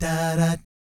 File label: "DAH DAT", vocal jazz fx